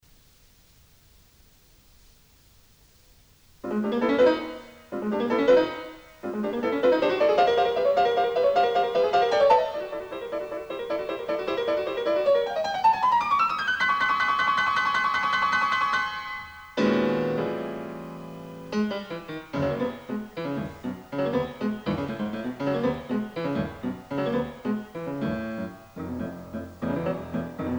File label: sonata, toccata; g bacewicz sonata no2 iii